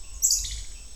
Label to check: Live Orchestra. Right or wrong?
Wrong